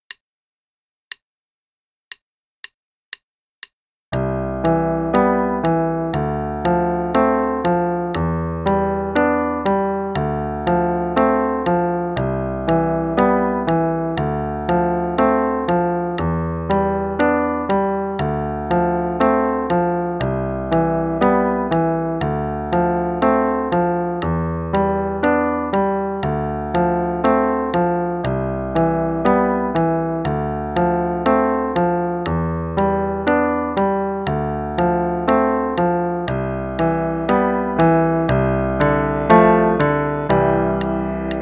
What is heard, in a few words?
C Pentascale Improvisation (teacher part, qn=120); Improvising using the notes of the C pentascale; Faber Adult All-in-One; Adult All-in-One Book 1